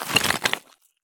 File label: stop tile; Escape from Tarkov